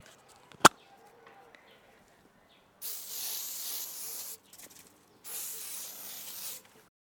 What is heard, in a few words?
Spray Can